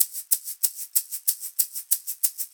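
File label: shaker egg hi, percussion; ILIO; Synclavier Percussion 2; World & Orchestral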